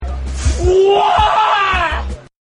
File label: fuaaaa